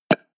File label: pop